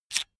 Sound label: electric drill template slide in fast; soundpool